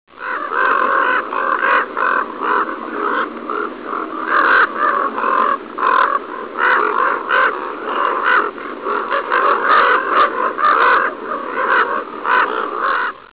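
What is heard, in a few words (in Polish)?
kruki, krukowate - corvidae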